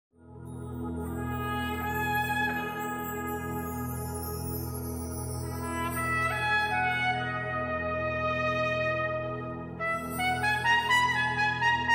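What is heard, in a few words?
soprano cad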